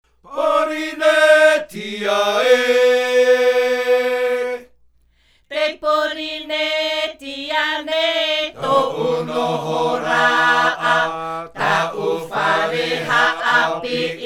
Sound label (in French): Himene ruau, chants polyphoniques maohi; Te taatoaraa o te mau reo